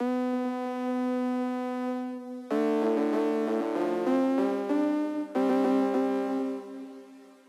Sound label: No Distortion, Synthesizer